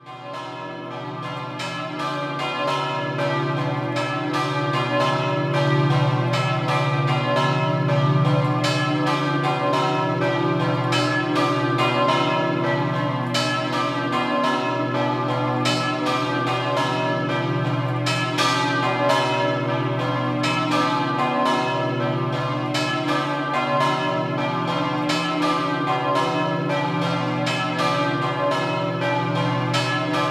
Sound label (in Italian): Aquileia, campane